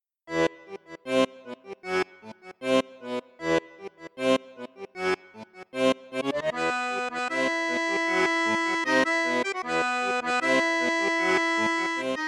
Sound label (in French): registre basson flute juste, accordeon; cavagnolo vedette